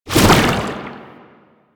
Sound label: Proto Infested Brachiolyst Projectile Whizz By; Warframe; Abilities